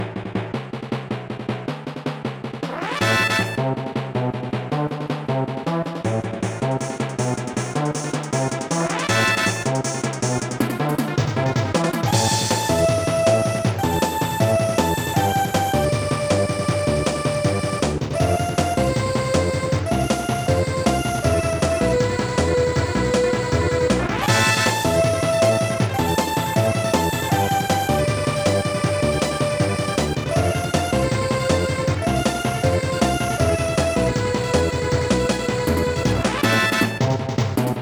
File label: Vocal Volcano (Volcano Area); Kirby Squeak Squad